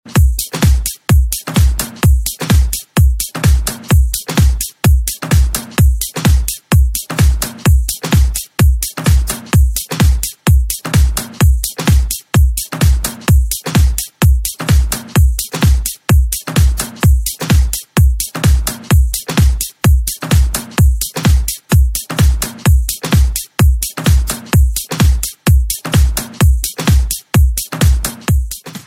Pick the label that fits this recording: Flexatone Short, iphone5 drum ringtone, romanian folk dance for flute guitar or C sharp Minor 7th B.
iphone5 drum ringtone